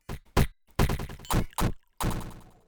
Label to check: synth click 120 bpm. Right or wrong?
Wrong